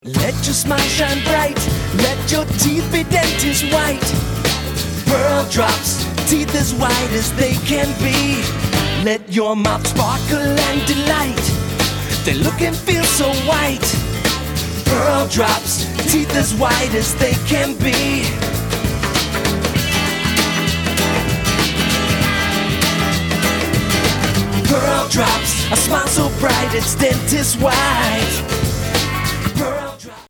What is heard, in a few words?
jingle